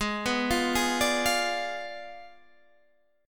G sharp Diminished 7th G sharp, Guitar Standard; G#dim7 arpeggiated; JGuitar

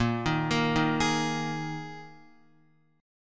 Bb 7th Suspended 4th Bb Guitar 4E,4A,5D,5G,5C,6F; arpeggiated; JGuitar

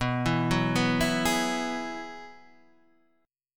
Ab Minor 7th Sharp 5th

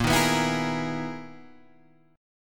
B Major 13th Bb, guitar

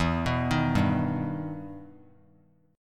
E Minor Major 7th E down; EmM7 arpeggiated

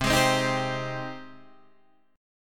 Ab Major 7th, C

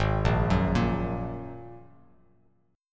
Ab 7th Suspended 2nd Ab; Ab7sus2 arpeggiated; 4,1,4,3

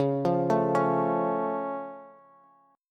Db Augmented, down, Bass; arpeggiated; JGuitar

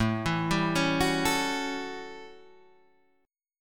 A 13th A down Guitar Half Step Down

